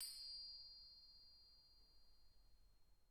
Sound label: Chime